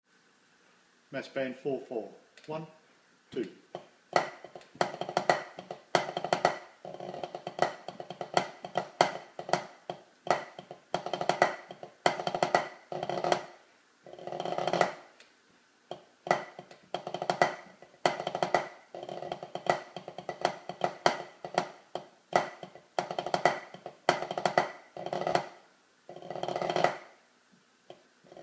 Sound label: Massed Band 3/4, pipe band